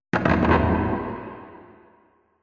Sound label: wood creak low; Half Life Alyx